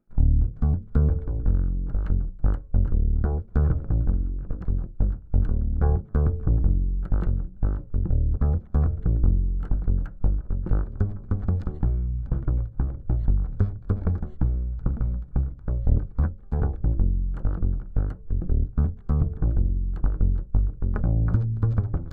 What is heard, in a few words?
Bass DI